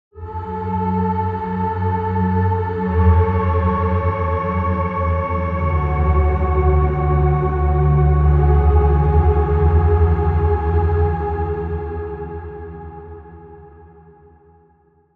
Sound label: Pad, Spire; Galactic Rotation; Routed Sound; Spire Expansion; Star Scavenger Vol.1